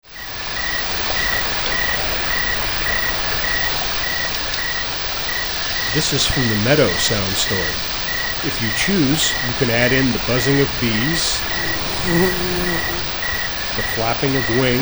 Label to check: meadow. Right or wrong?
Right